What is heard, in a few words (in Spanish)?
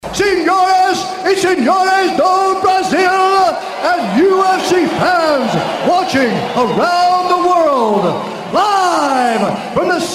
reggaeton pancadao; sf car sound uruguay